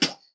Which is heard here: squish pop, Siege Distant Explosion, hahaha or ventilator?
squish pop